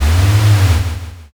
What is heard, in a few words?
servo